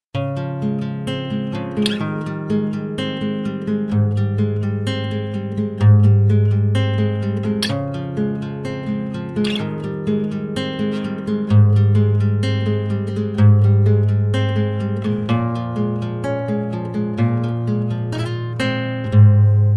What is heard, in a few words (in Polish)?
gitara; Nagrania Lokomotywy